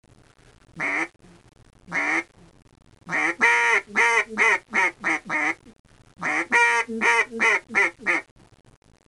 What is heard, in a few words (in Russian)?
Утиный манок Одинокая самка